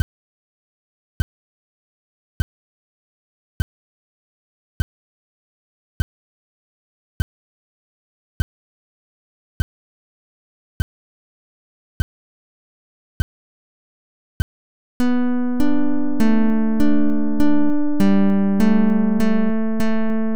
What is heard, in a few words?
piezoelectric